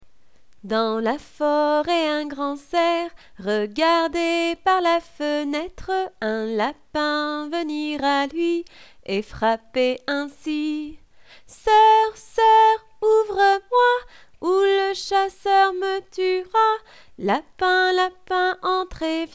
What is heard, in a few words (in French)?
Dans sa maison un grand cerf chant